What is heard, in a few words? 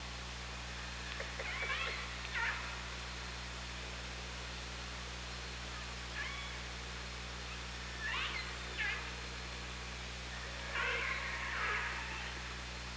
OrcaLab